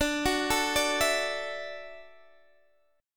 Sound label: D-Major Add 9th, D, Guitar, DADGAD